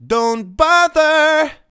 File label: Don't Bother, Male Vocals